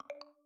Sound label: Incoming Game Invite; BF4; Origin